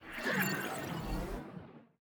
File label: ship engines; World Of Warships